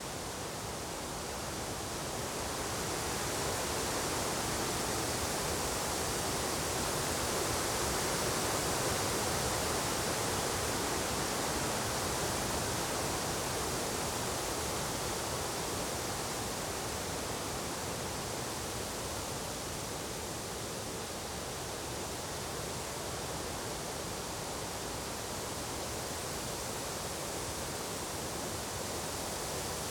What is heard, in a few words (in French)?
vent arbres